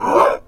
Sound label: Infested Melee Grunt; Warframe; Characters